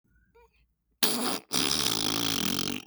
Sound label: farting sound effect